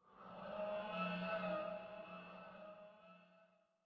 Dino Distant; docks

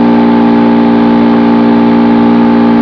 neon; de frosty

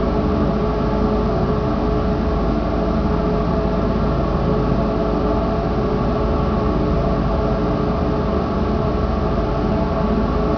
machines; de Nostromo

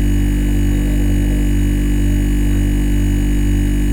fridge hum

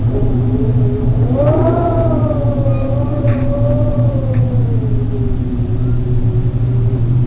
wind; Libra

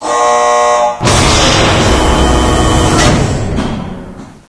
core door

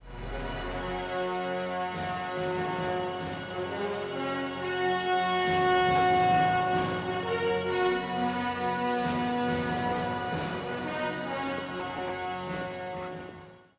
theme

